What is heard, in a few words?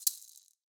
maracas Single Shake; Raw Perc One Shots; Slate; SD SEISMIC Drum Sample Pack